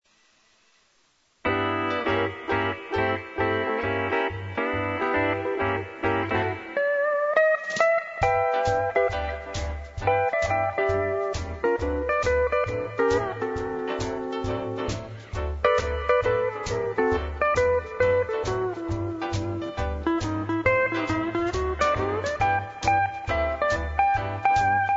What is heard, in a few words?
Western Swing, instrumentals